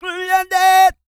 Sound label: SING, World Voices, CARIBBEAN